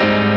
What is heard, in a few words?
x 3 4 4 x 3 Gmaj7